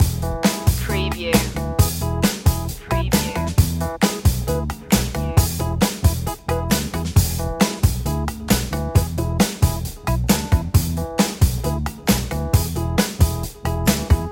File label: easy groove loop